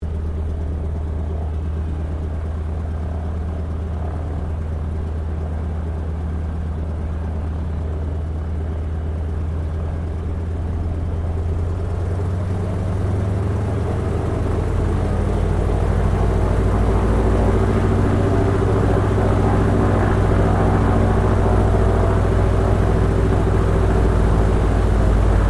AIRCRAFT BOEING PT-17 STEARMAN IDLE SHUTDOWN INTERIOR, Stearman Trainer; 1939; Airplanes; Vehicles